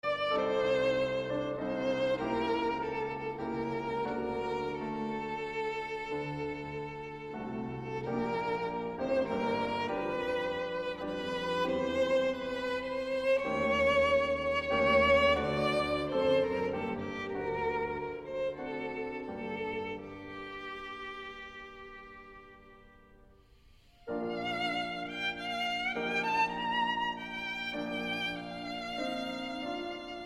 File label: Violin Sonata in G Minor